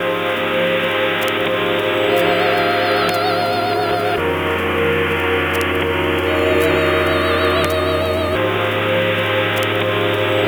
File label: Funky Punch; madness project nexus